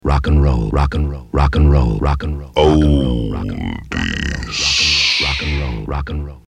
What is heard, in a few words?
Oldies